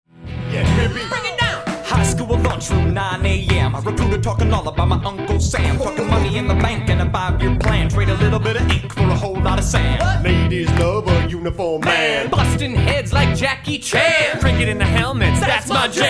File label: Grunts; Rap Sample